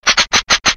dryfire; Defective Turret; sp sabotage factory

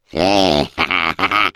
laugh, es; Defective Turret; sp sabotage factory defect

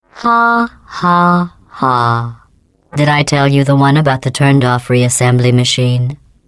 taunt laugh; GLaDOS

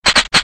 dryfire; Defective Turret; sp sabotage factory

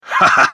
laugh, fr; Cave Johnson

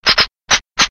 dryfire; Defective Turret; sp sabotage factory defect

